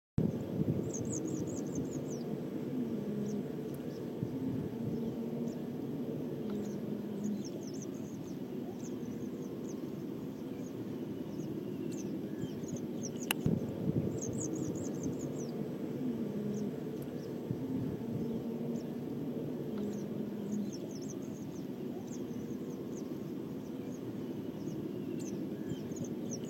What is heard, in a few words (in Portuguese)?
canto da petinha de garganta ruiva